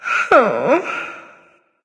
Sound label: sigh; smite; izanami